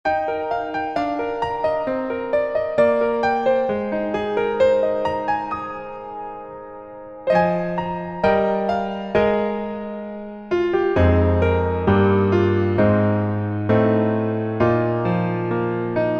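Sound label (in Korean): Piano version -1키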